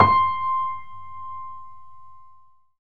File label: PIA GRND, Roland